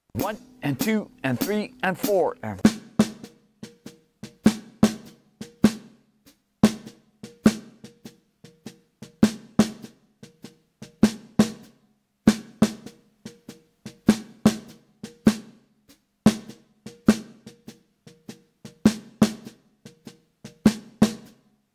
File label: Melody Swung 8ths